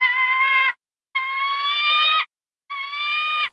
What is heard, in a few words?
falcon